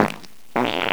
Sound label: fart